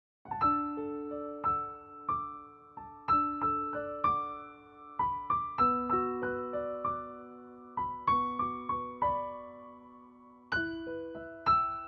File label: Piano